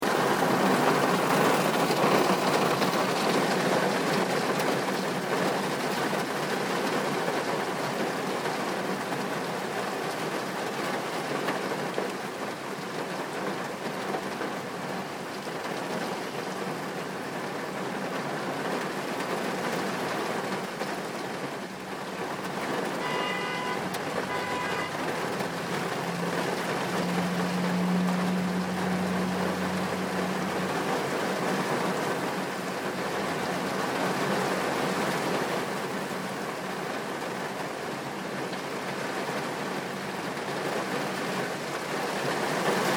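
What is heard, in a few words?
rain after long time without any; bunny river falling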